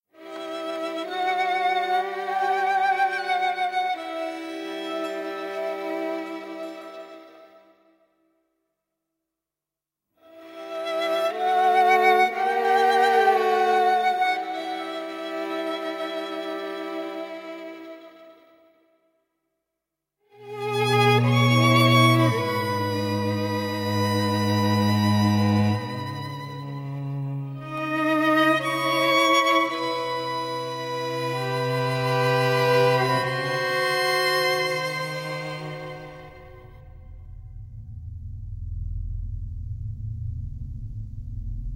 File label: Instrumental; Maqbool